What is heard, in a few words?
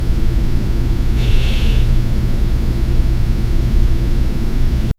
quiet bedroom ambience